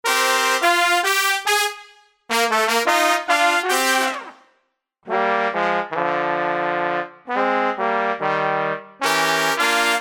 METALES; multitracks y midi

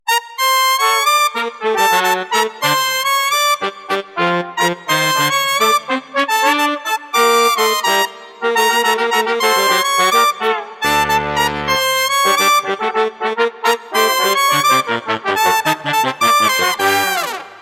salsa Gm METALES; multitracks; G menor

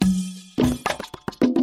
percusion all; multitracks y midi

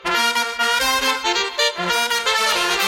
METALES; multitracks

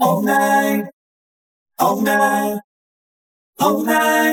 COROS; multitracks

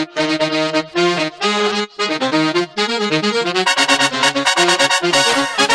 METALES ALL; multitracks

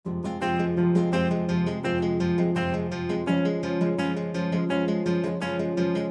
GUITARRAS ALL; multitracks

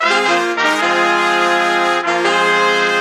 METALES ALL; multitracks

